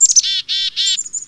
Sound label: chicka, wildlife